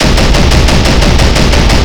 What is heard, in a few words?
flakvierling loop; WAR